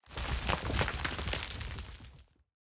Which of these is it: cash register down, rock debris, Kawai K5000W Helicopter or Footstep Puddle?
rock debris